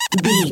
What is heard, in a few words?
voice fun creature squirrel